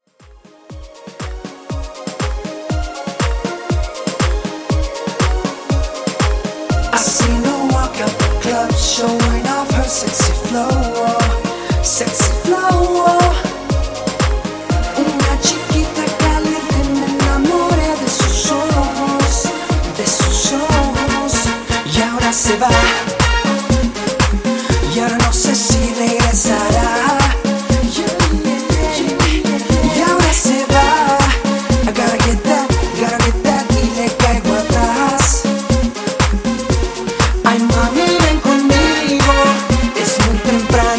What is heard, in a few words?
vocal latin reggaeton 120 bpm